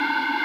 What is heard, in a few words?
Kawai K1 pan flute sus; wavetables